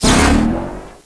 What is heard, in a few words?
Force Field Hit; Defiance